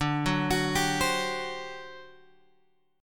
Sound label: D 11th D; D11 Chord {10 9 X 11 8 8} arpeggiated